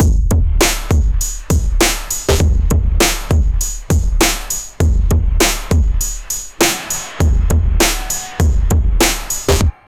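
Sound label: beat for pirate outro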